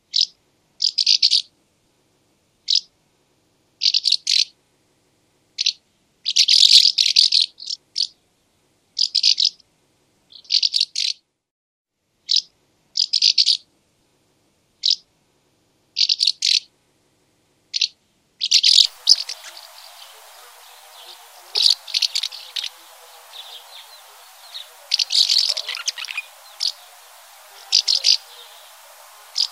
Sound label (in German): mehlschwalbe delichon urbicum; 100db; Mehlschwalbenhaus; klangattrappen